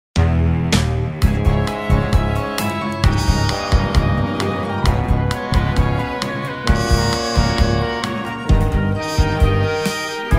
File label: no vocals